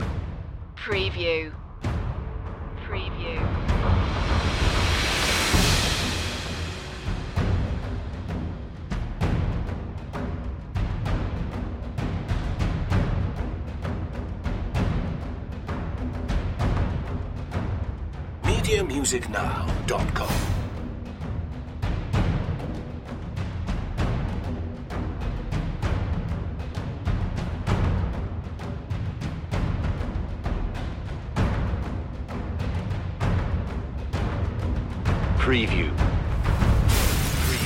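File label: percussion only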